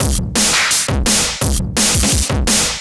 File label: Beat Digi 170, distorted drum loops 170bpm; musicradar